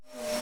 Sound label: Void Suck